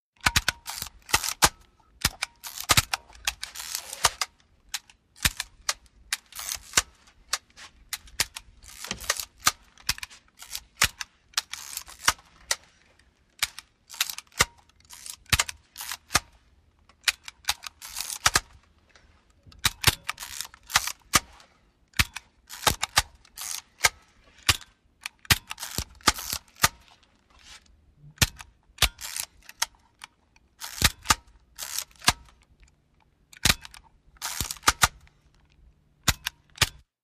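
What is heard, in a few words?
Instamatic Camera Click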